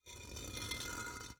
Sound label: Cinder Block Sand Scrapes; Foley